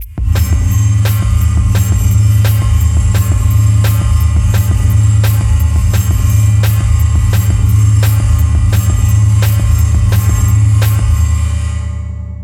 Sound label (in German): Gong Beat Gong grosse Kette; AudioThing Hainbach Bundle